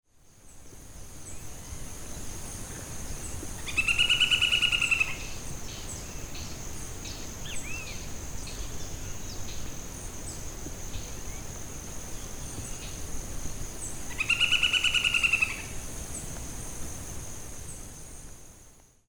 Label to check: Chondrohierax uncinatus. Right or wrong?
Right